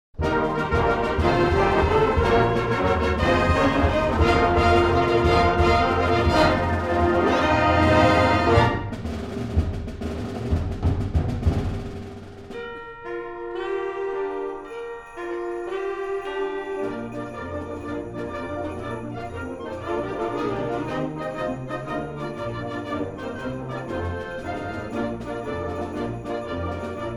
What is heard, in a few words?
JINGLE BELLS MARCH, symphonic band; WEST HILLS SYMPHONIC BAND DEC 2023; James Pierpoint